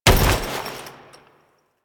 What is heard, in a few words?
Foley Weapon Fire; Warframe; Kahl